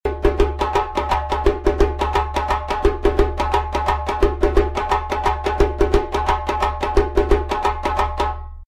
djembe drumming patterns, african drums

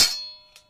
sword clash

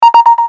iphone pulse

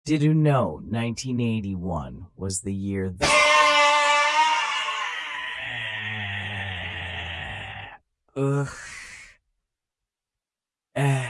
augh

